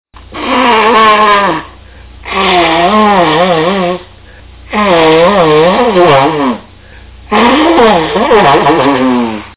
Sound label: Nose Blowing